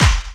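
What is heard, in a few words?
snare drum bass drum, Dance Mega Drums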